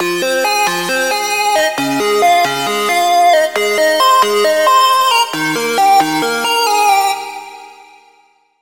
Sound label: Lead; Routed Sound; Sylenth1 Expansion - Star Scavenger Vol.1